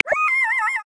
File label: Black-Backed Jackal; Xiaomi Redmi-Note-8-Pro; Africa